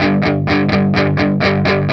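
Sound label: 125, Vintage Blues Guitar rhythm; USB Soundscan vol.22; AKAI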